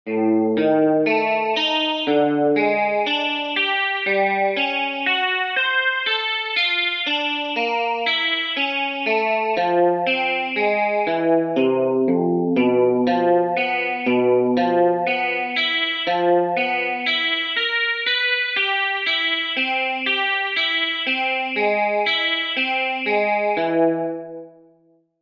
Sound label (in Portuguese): Exercícios Guitarra 60 bpm